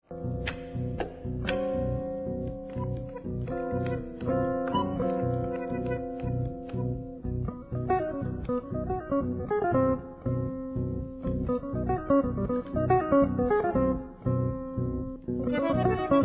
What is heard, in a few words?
Jazz meets Tango